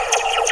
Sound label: SPACEPHON; PlatinumPhattCD2; E-MU Producer Series Vol. 8 – Platinum Phatt; Flexis Etc